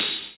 mellow hat a sharp key, pedal hi-hat sample, Loudest frequency: 6634Hz